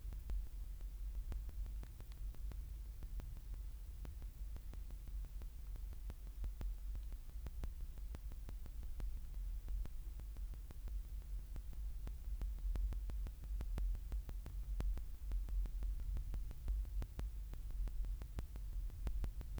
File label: EM64 background noise; Gain=+30dB